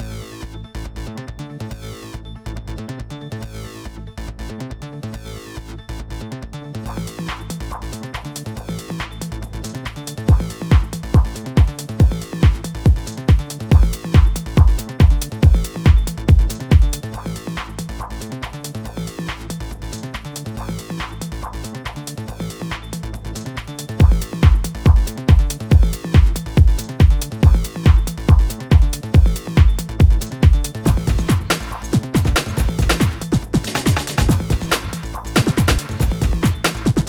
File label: boxing ring music